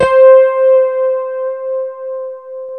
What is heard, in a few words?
E-RHODES